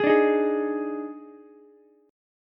D sharp Major 7th Suspended 4th, D sharp, down, Ukulele; strummed; D#M7sus4; JGuitar